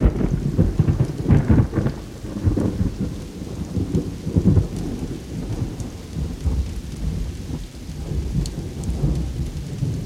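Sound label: Thunder Crack Low Rumble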